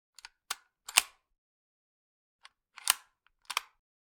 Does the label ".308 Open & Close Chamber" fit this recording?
Yes